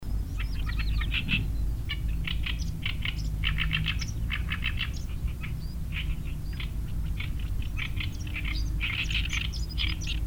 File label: Oriental Reed Warbler 2 birds Song, Fujian Changle; rousserolle d'orient acrocephalus orientalis